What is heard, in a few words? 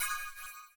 CYM, Ethno Techno; ILIO - Ethno Techno